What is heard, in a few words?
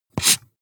marker mark hard short